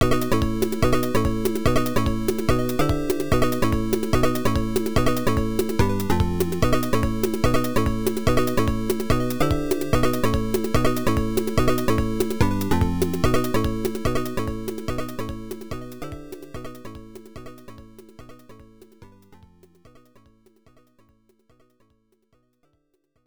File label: Continue; Broadway Legend Ellena